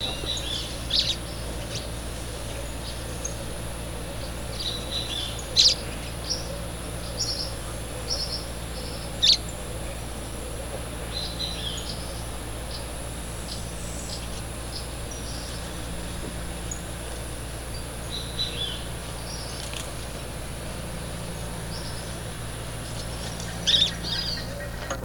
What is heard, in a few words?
orange fronted yellow finch